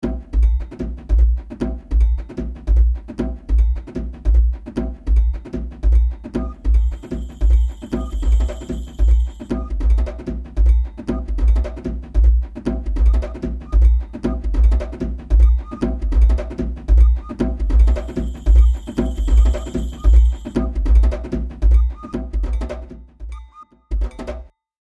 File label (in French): PERCUS EN ACTION